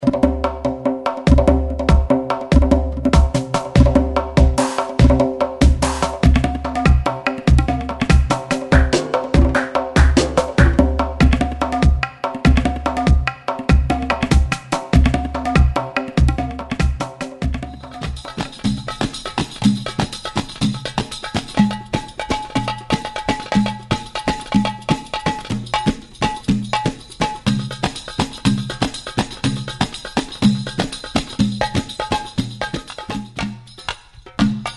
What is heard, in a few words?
Oriental styles